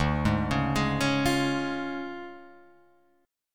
D Minor Major 7th Flat 5th, D

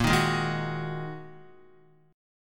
A sharp 7th Suspended 4th Sharp 5th, Guitar chord; strummed; JGuitar

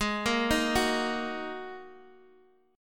G sharp 7th Suspended 2nd, Guitar, Open D; x,x,6,4,6,4; down; chord shape

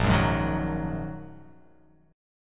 A sharp 7th Suspended 4th Sharp 5th, chord

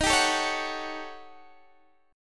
Eb 7th Sharp 9th Flat 5th, Guitar Open D; down

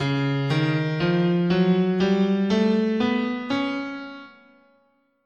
C sharp Major Locrian, guitar, scale

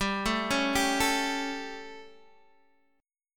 G Suspended 2nd Flat 5th G, Guitar, Open D

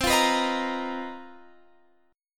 B Major 7th Flat 5th C, chord shape; BM7b5/C; strummed; JGuitar

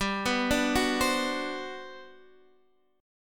G Major 11th, chord; arpeggiated; JGuitar

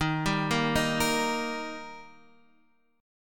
D sharp Major D sharp, chord; arpeggiated; 11,10,8,8,11,x; JGuitar

